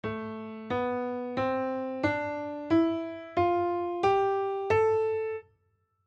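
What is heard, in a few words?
scale A minor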